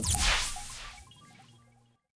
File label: force distract